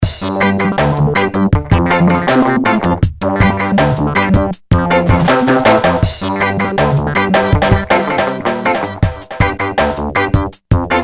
Dub

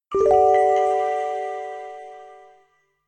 Email alert

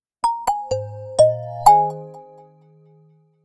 Wakeup; Nokia X100

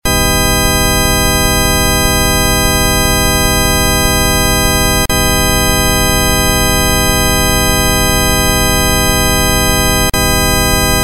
MultiSine 20-20kHz 0dBp, test sounds; LG B470

